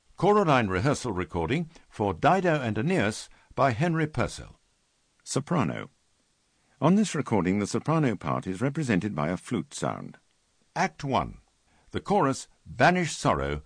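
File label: Soprano; Purcell D&A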